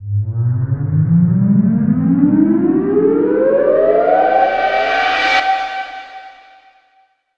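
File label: SYN left, Production Effects; Best Service - XXL Production Effects; SYN-FX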